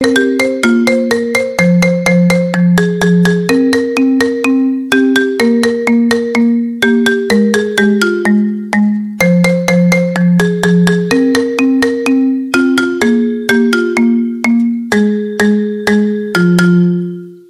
Phone Ringtone

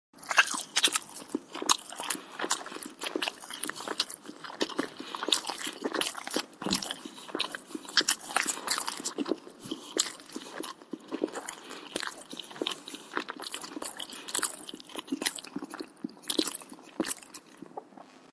chewing; made with Voicemod

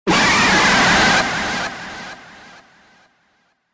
jumpscare; mickmick

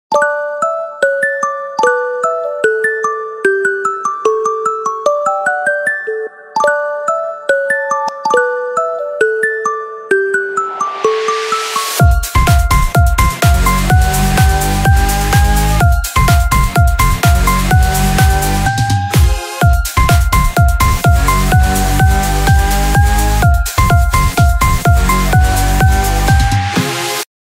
Samsung ALARM Intro

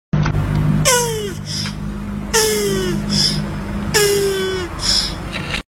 AAAAH Turtle

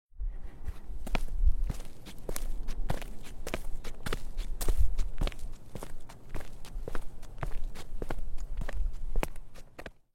Concrete Foot Steps

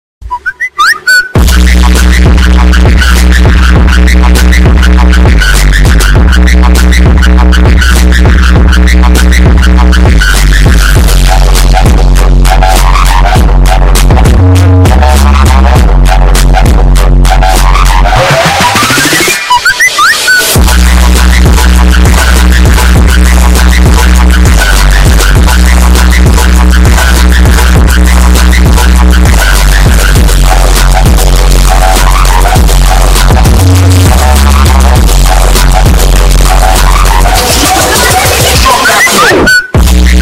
Car Drip song Bass Boosted

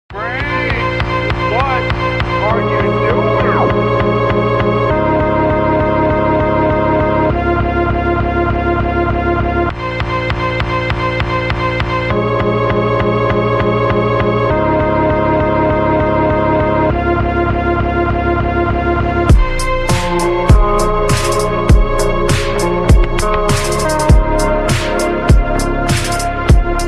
instrumental slowed; meme sound

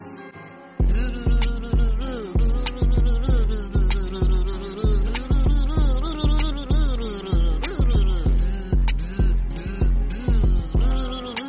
Bee Music

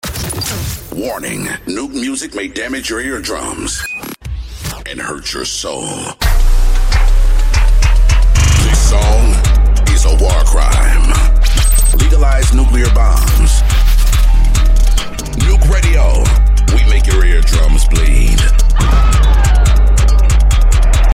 NUKE RADIO

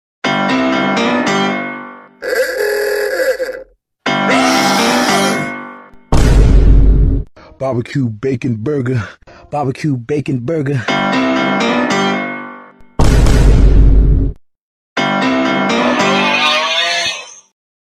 goofy ah ringtone; made with Voicemod

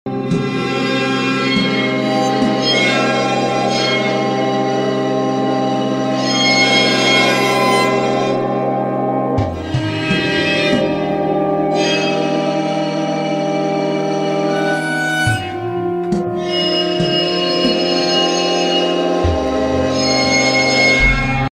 Eerie Waterphone Melodies

